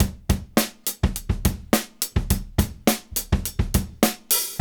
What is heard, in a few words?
LOOP 104; AMG Gota Yashiki Groove Activator; JR.LOOPS 104